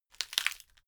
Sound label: wood crack slow